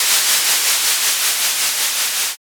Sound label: balloon inflate